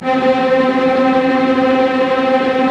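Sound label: STR SEC, strings; STR ORC FX; Roland - LA Composer vol. 1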